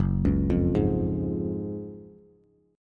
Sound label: Gb Major 7th Suspended 2nd, Bass; GbM7sus2 arpeggiated; JGuitar